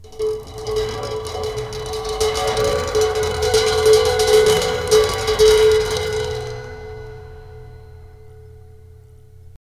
liquid jungle, cymbal

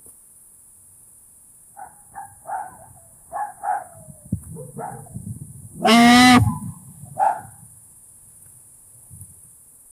a majestic tiger prowls

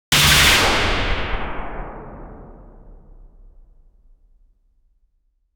high energy sci-fi laser, beam

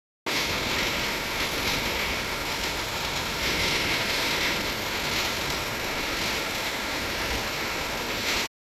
relod the tank with misil, tank

